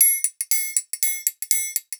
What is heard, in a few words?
120 TRIAN, Percussion Loops